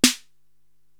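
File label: Snare Drum New, Drum Machines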